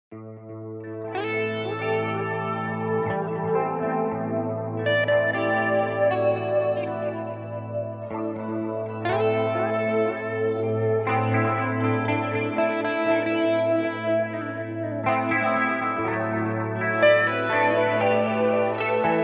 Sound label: waves and wind